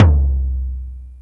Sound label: large room tome drum sample c key